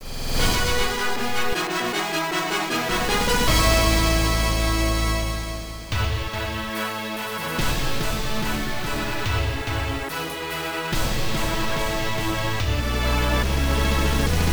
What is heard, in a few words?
Fanfare; Ending 1; Run and Gun; arcade